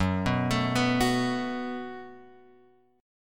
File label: F sharp Minor Double Flat 5th F sharp; F#mbb5 Chord {2 2 X 2 0 2} arpeggiated